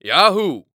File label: Human Male Yahoo Deep Yahoo